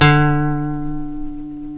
E, funk guitars

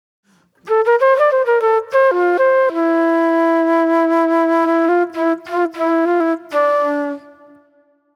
Acid Jazz Flute

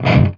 chunk F#, heavy metal; Greytsounds

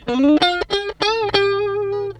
GUITAR, Funky GtrLicks; E-MU Formula 4000 Series Vol. 1; Hip Hop Nation

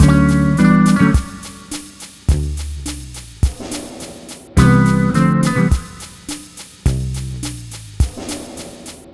Multi Guitar Bossa Mix-D, 105; Zero-G Kocktail Kollection; Construction Kitz (Lounge)

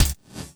BD, 160 BPM; Ueberschall; Drum & Bass Flash Trackz

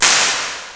Slap, Fairlight CMI III Drum Machines; 300 Drum Machines

